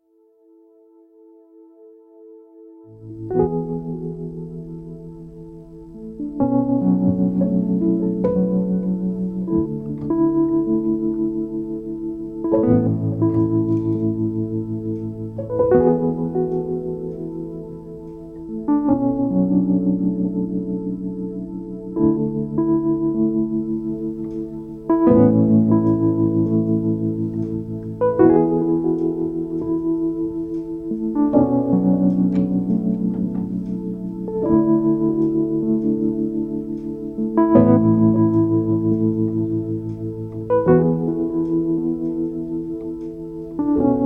Blue Sky Morning instrumental without backing vocals drums percussion; upright bass